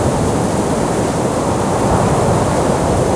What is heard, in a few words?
Wind; XiuXianGame